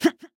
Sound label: basic attack; Akali